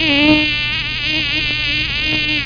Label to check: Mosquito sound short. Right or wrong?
Right